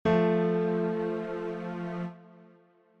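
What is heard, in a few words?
F Major Chord F A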